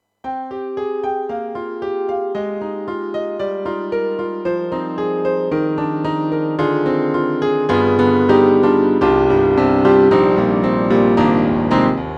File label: Yamaha MU500 Grand P